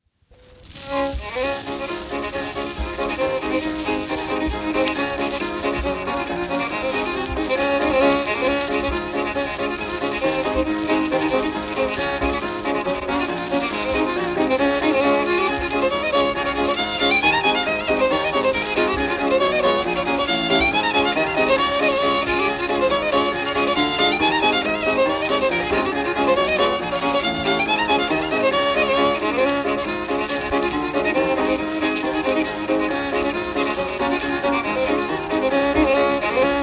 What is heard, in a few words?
Fiddle Song